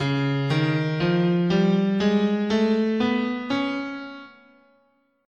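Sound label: D flat Lydian Minor, guitar, scale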